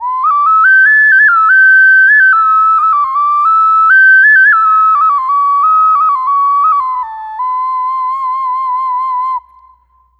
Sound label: Hi Occarina